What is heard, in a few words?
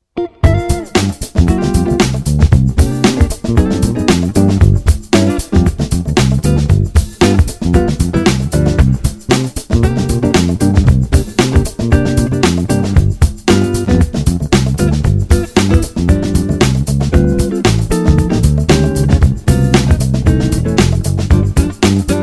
bass